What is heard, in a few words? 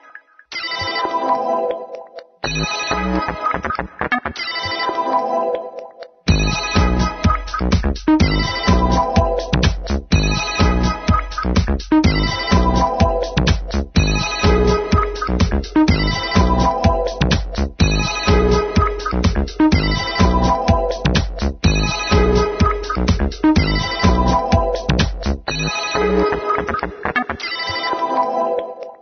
Telephone Dance